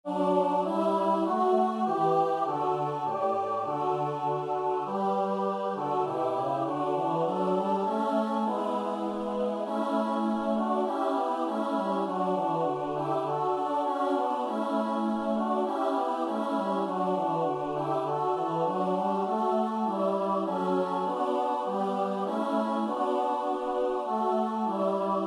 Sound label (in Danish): Lyd partitur SAB; Dejlig er den himmelblå